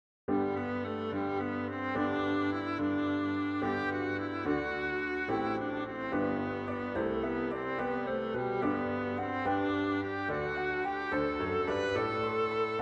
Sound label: Easy Viola